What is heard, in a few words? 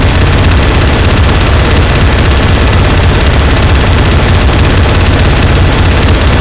gunnercannon fire; tf2classic